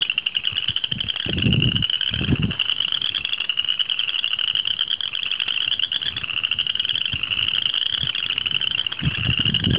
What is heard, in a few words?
Pseudacris fouquettei